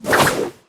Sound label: slash